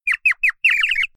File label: knockout dizzy; Henry Danger - Day Of Danger!